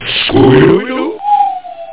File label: howl